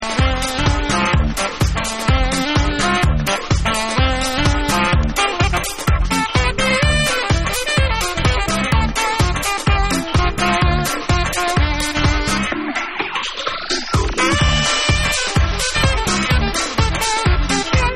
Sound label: Live Sax